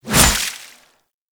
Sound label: Hover Whizzby Sorrow; Pax Duviricus; Warframes